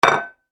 Kitchen Plate On Wooden Counter

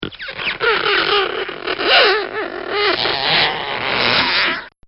Comical Sound Series Squeaks; Funny Sounds

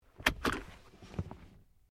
CAR SUBARU LIBERTY INTERIOR SEATBELT UNBUCKLE; Family members Subaru; Vehicles

